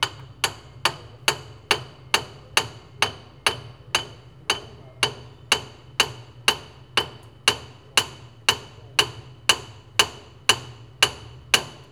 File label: Hammering, INDUSTRIAL